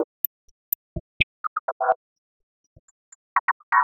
perc 125 chirrup, Percussive Loops 125 bpm; Sample Magic - Transmission-X

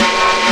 A SN, DRUMS; Analogon

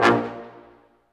BRS, Staccatos, Ensembl stac; Roland L-CD702

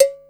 cowbell, Yamaha Motif ES drum machine; 300 Drum Machines; Yamaha Motif ES-Series

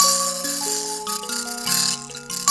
PLK Bush Mbira; Roland LCDP11 Africa VOL-1; M'biras

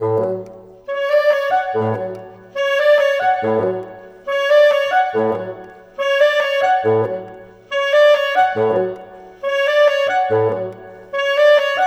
Bassoon Clarinet; Cinematic; Orchestral; Sonic Foundry (Sony Creative Software); Orchestral Series VOL-3 - Cinematic

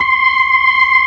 B3, Drawbars; E-MU Producer Series Vol. 2; More Studio Essentials

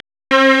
STRNG; CMP - Analogon

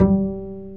BASS G4; ORCH.COMP

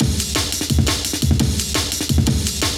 Loops, breaks; M8

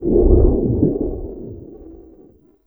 FX, Drum & Bass, 150 BPM; Ueberschall; Drum & Bass Resonance

